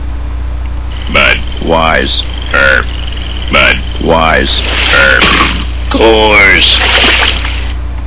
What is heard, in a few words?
beer